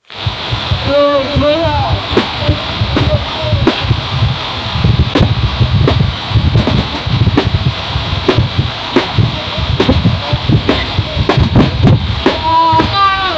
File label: body